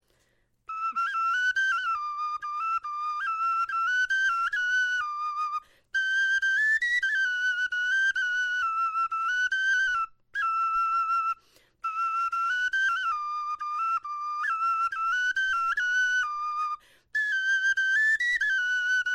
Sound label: melodie, knochenfloeten